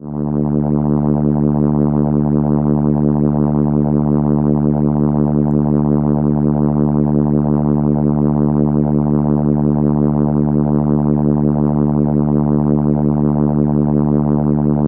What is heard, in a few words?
Cello D3, ARP Solina